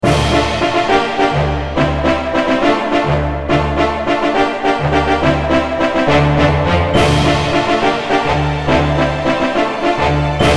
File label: Mini Orchestra